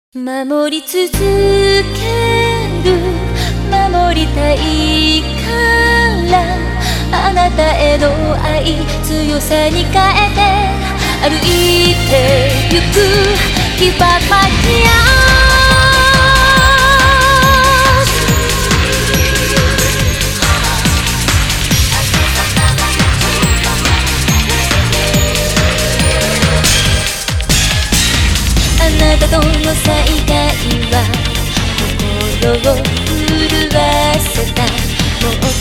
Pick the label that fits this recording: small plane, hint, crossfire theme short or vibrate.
crossfire theme short